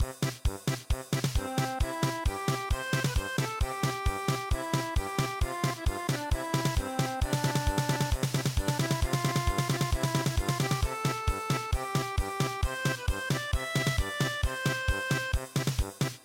camp morning German Polka

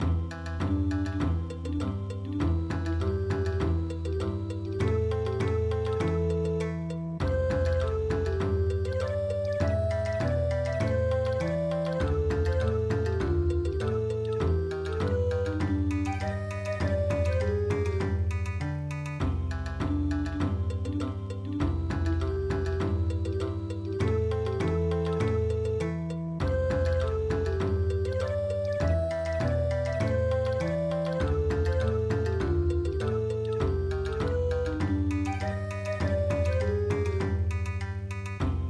Bon Odori, traditional; midi traditional; konpira hunehune

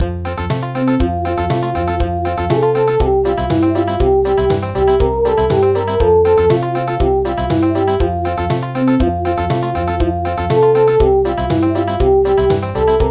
Country; traditional; Morning tide

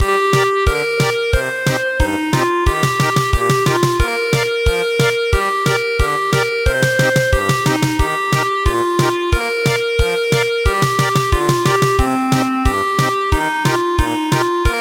lullaby German Polka; traditional; Thai

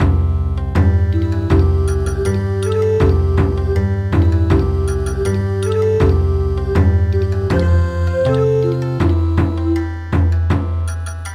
Bon Odori

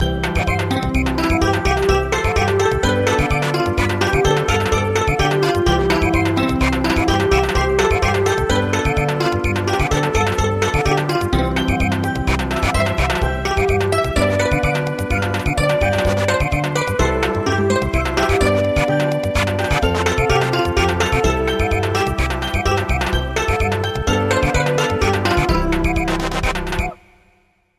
Samba

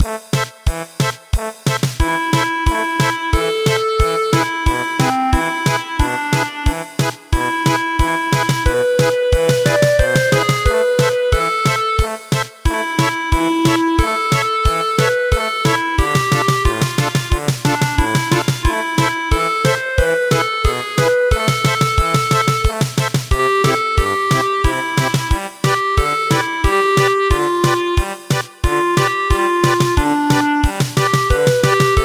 German Polka

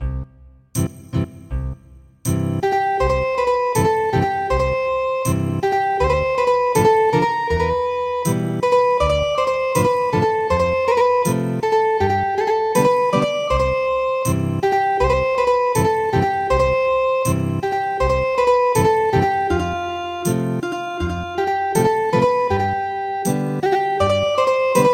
Ethnic Guitar; The wooden clogs

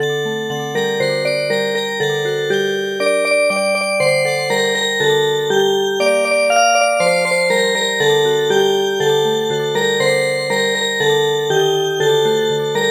Music Box

